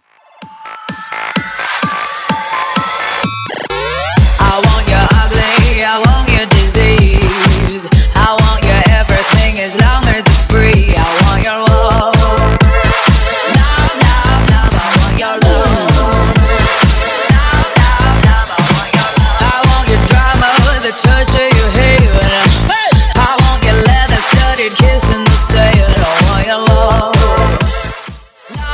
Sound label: Bootleg Smashup 128 bpm